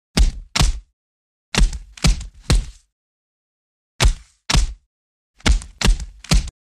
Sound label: Boxing Glove Punch